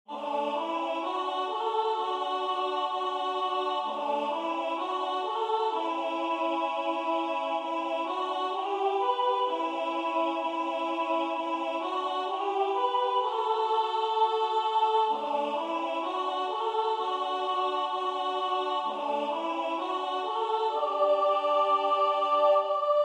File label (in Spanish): canto 2 voces soprano; ADVIENTO